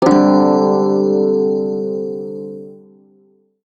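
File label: Shawzin Pentatonic Major Chord; Warframe; Prime Shawzin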